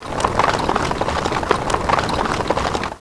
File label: gallop